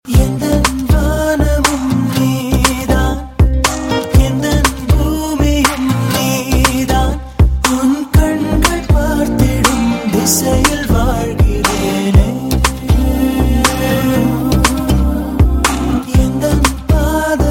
Tamil latest ringtone

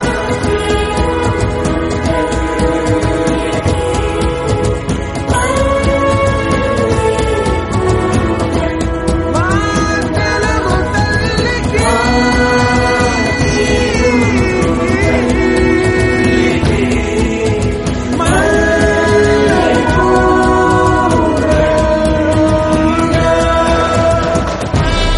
telugu ringtone

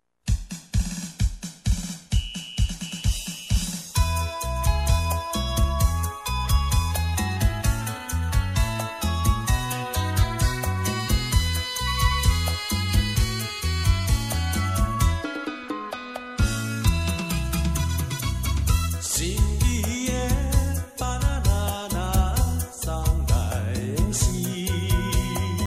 taiwanese music ringtone

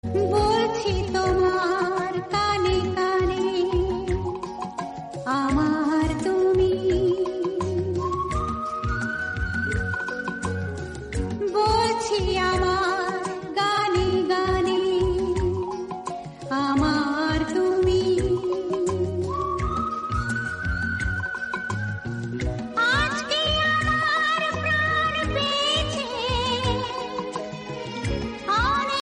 bengali ringtone

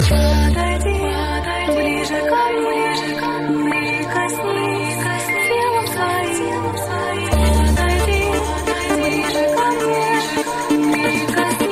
russian techno

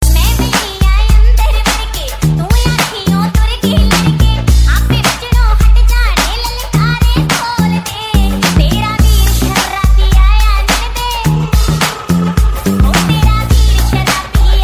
punjabi ringtone

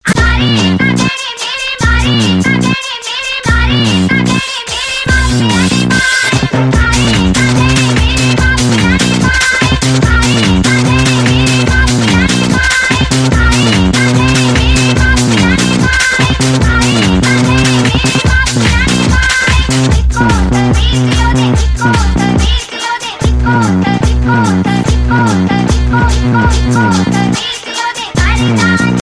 punjabi ringtone

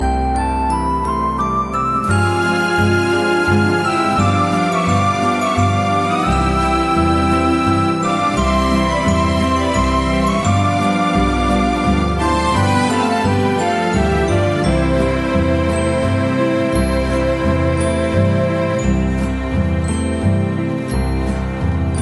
paraguayan music ringtone

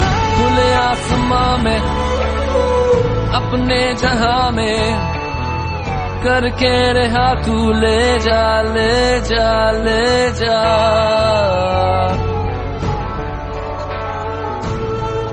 slow

